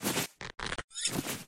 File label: Butler Untuck Knife; lethal company; ambience; windows